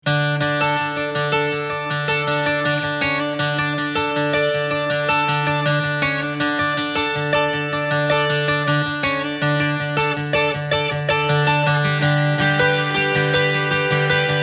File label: Indian Riff